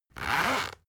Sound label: zipper close